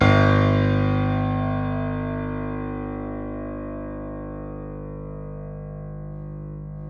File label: C7 F, The Ultimate Piano Collection; East West